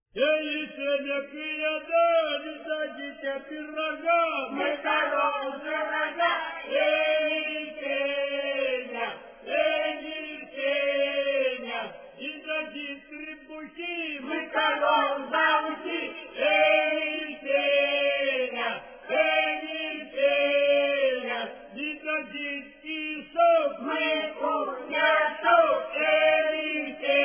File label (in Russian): протяжная, с.Секирино Скопинского р-на; запевает; За юрбана, толькя, за столами